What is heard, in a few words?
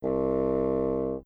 bassoon note